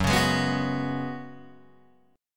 Gb Suspended 2nd Suspended 4th Gb, down, Guitar, Open G